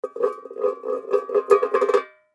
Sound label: Tin Small Set Down, Tin Set Down Wobble; Foley; Set Downs; Objects; Blastwave